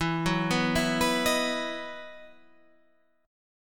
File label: E Major 7th Suspended 2nd, Guitar, Half Step Down; EM7sus2